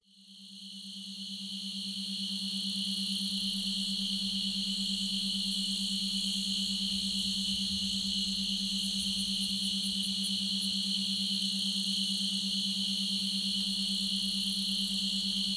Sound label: Quiet night Binaural Beat Relax